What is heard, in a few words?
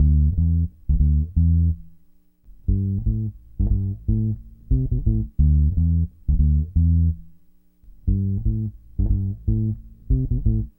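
bass, D, Hip Hop & RnB; ProSamples vol.34 - Hip Hop & RnB 3; Phased Out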